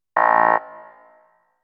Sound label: error beep Game Menu (Error Sound); dot hack